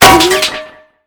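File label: hit vehicle; ACE3; Arma3; destruction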